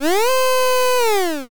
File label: elephant